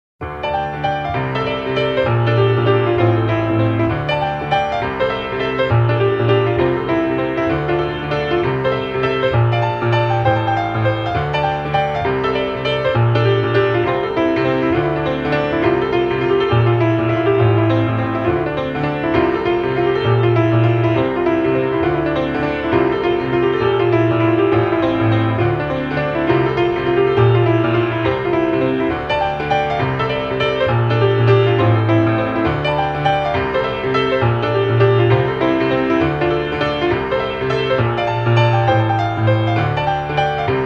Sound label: Jazz